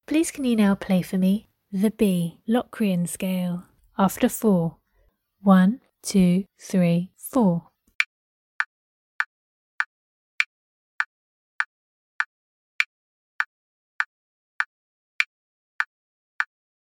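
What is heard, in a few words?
locrian scale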